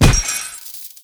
Skill Snitch; FlyForInsanity

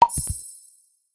life button; Candy Crush Friends

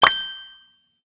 octupus hit meter; Candy Crush Friends

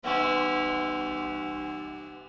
alarm clock; Flaris; Fly For Insanity

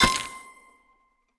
match sequence sfx; Candy Crush Friends

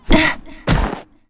blue harpy die; Npc Ship; FlyForInsanity

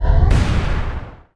Action Start; FlyForInsanity

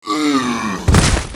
Skel Leader die; FlyForInsanity

